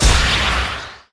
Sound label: Trap Sticky Exp